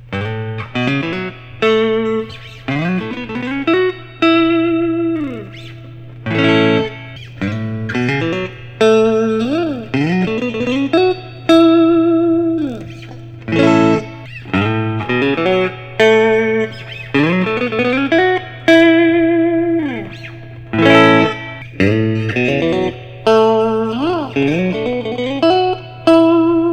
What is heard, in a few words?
lentz reserve s style brazilian